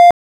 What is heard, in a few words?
Wall; Pong; EventHorizonStudio